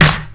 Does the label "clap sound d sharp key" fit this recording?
Yes